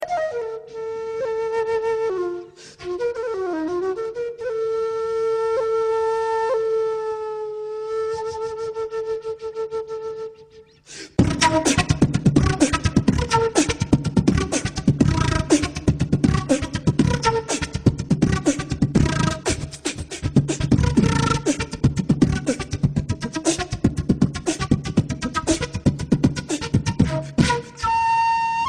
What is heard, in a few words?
Best Flute Ever